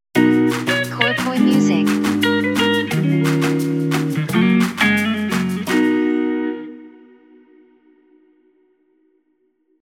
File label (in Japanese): jingle ウォーター